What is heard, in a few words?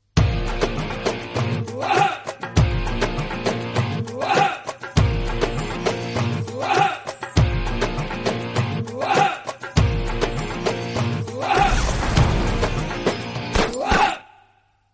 bollywood musical tone ringtone; nokia ringtones